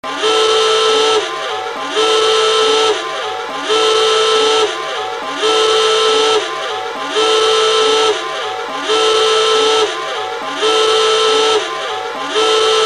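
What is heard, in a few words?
alarm siren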